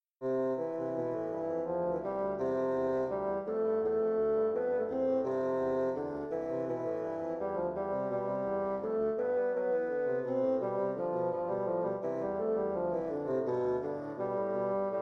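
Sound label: air in C major, 2 bassoons; hwv 597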